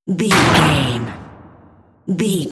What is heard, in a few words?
cinematic stab hit trailer